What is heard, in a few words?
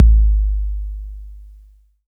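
bass drum 808 Tape Decay E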